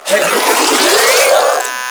Spin Scream; Warframe; Proto Infested; Ancient; Abilities

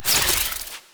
Scythe Spin, Weapons; Stalker Jade Scythe; Warframe

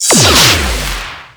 Teleport; Warframe; Captain Vor

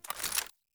AK47 Reload Mag Align; Tenno

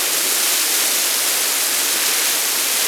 Ocean Water High Pressure Loop; Ambience; Props; Warframe; Grineer Ocean

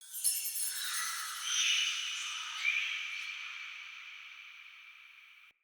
Day Texture, Ambience

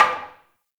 Single Wooden Impact; Warframe; Ambience; Nineteen Ninety Nine

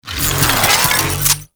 Short Bow Charge; Warframe; Duviri; Dax; Weapons

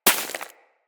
Bullet Impact Debris Snow; Weapons

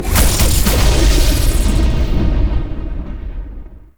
Dead End Crystal Final Explosion; Echoes; Warframe; Entrati

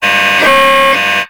puzzle input correct; Ambience; Gameplay; Warframe; Corpus Gas City; Gameplay Remaster

